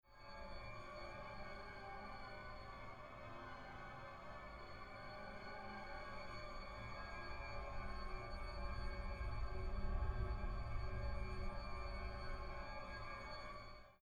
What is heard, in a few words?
Drone Orchestra